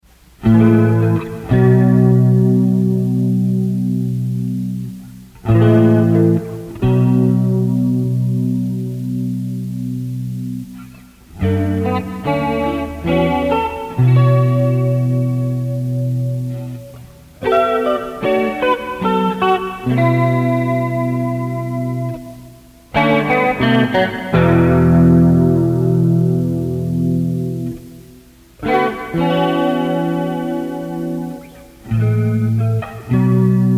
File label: Chord Melody, Solo; 59th Street; Kensington Farmer's Market, May 15, 2022